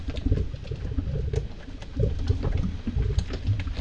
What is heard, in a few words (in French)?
Bouill VerySlow Move; Puddle Plus